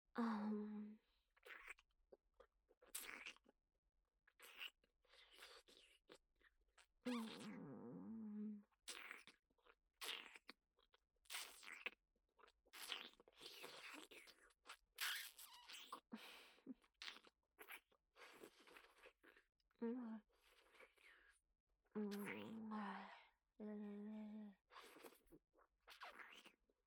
フェラ, ASMR; アドリブ集

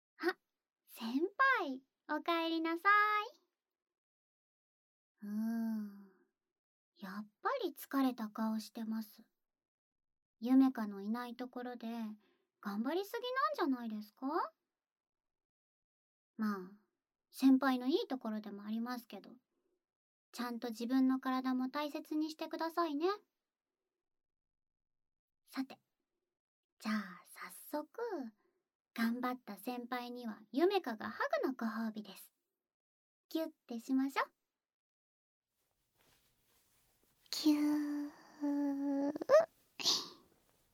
耳かき; 今度は耳かきです; 距離感バグってる後輩～小悪魔後輩・夢華～

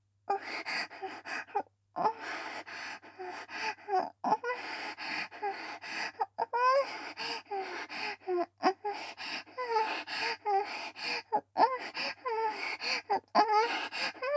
喘ぎ と 吐息; 【甘々媚びアクメ】性欲旺盛な陸上部の先輩後輩JK、ベロチュー青春溺愛えっちでコーチの汚ち◯ぽ生ハメ特訓♪

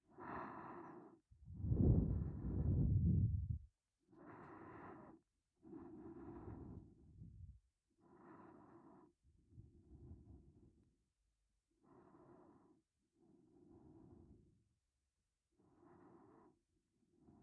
寝落ち用 寝息ループ （右）; ディータ; 低音ボイス奔放執事。

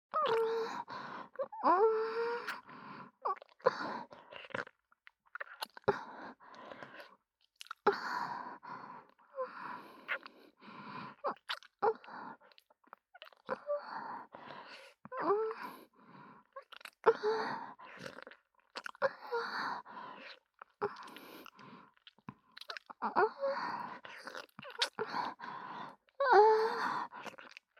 ベロチューループトラック, ASMR; 開始数分即ハメボイス～可愛い後輩は酔わせてお持ち帰り→即生ハメしちゃう先輩に捕まって逃げられない!

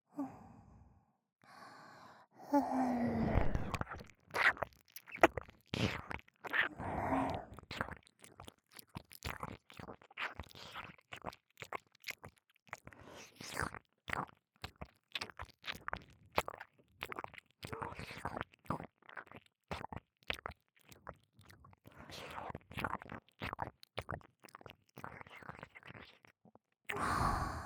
耳舐めと唾液ローションマッサージ, ASMR; やりすぎな耳かき屋さん・弐; 性感ASMR

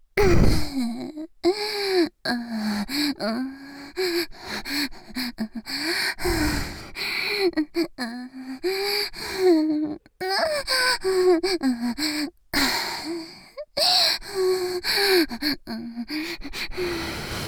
手マンコとひょっとこバキュームフェラ 喘ぎ, ASMR; ギャルフェラッ!!学校は援交ギャル風俗店ッ!!

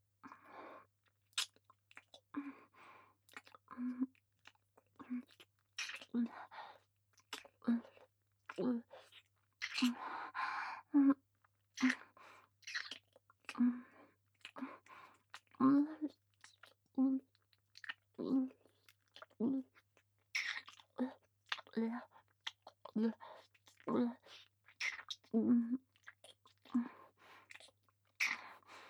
ディープキス; ハナ

